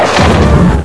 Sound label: houndeye blast; hl2